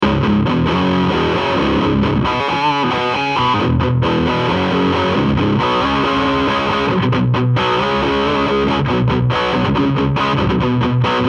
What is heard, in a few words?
max gain les paul